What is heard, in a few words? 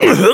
damage; MonsterHunter; Fight